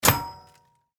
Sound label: obstacle hit metal